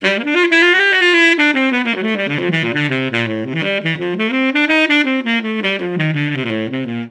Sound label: Tuba